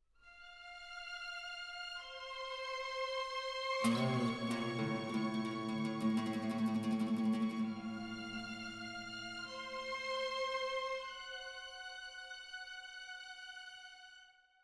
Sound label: Combat Lite Mood